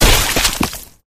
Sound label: flesh destroyed medium; flood